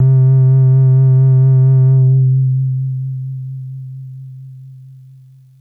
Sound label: SYNTH